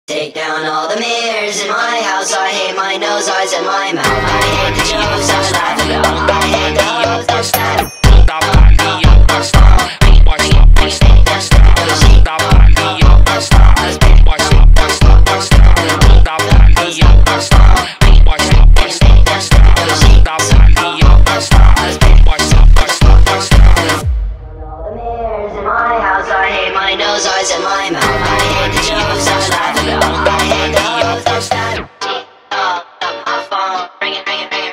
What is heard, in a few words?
funk, phonk